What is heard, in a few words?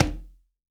CONGA, STEREO CONGA